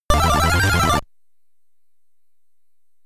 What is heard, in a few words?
Get Ready! (SID Stereo); Ninja Commando; Commodore 64